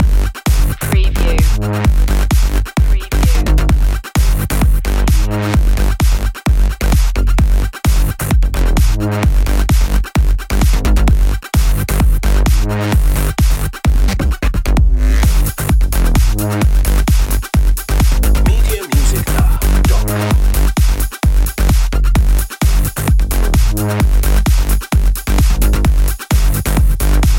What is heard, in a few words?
jackhammer loop